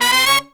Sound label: Pop & Funk Brass